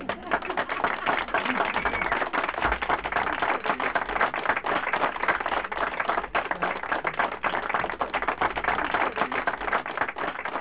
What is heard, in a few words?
clapping